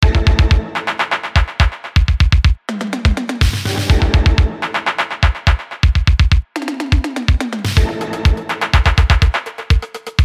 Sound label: linndrum